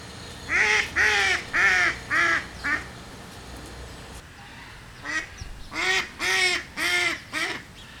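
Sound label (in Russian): kryakva golos, mallard voice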